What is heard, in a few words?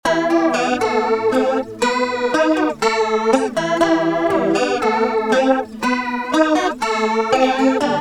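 acoustic guitar polyflange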